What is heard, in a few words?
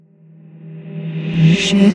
Fx Vox; Equipped Music; Loungin House